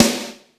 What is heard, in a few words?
FM SD, MOD. DRUMS; East West; Global Sounds